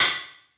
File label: Open Hat Club Hollow; Lost Tapes From Mars